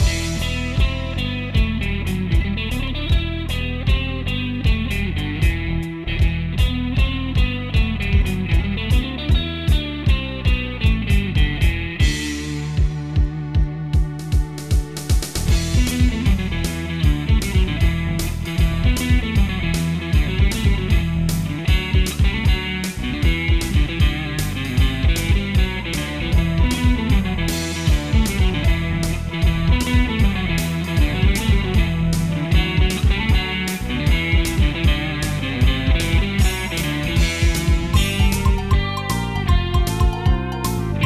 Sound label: Pirate; jett tailfin; wii u